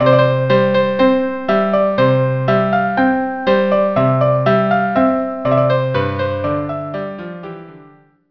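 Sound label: March in C violin cello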